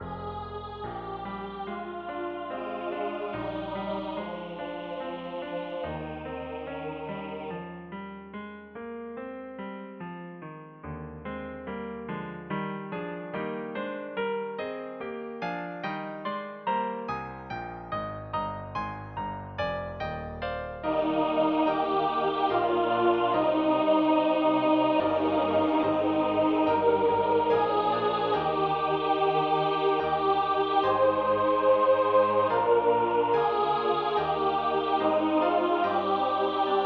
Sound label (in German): Verleih uns Frieden Sopr.; F.Mendelssohn